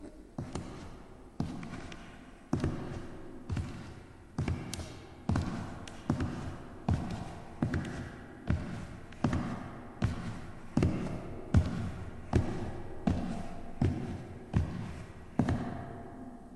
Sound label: Step